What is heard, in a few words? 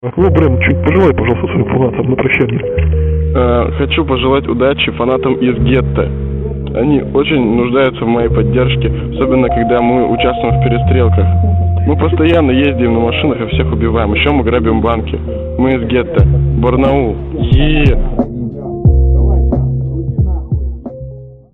intro drum instr